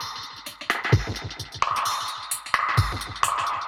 Drum Kit Echo Kit 130, dub drums samples, 130bpm; musicradar

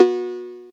analog cowbell, Yamaha TG-500

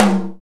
RX GATE TO, RX-5 DRUMS; Greytsounds VOL-1

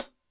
Cym R&B Hat, Drum Machines Alesis D4

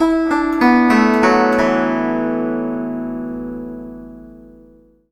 GUZHEN; Spectrasonics; Heart of Asia

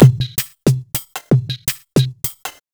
house, Akai MPC2500, drum machines

